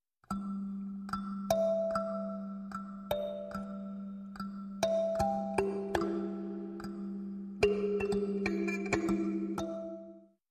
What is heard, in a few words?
Kalimba Duet